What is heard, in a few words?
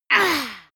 female attack